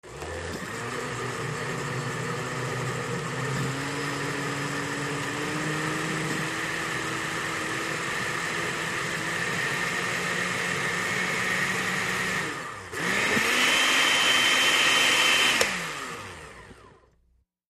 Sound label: Blender Increase Spe; appliances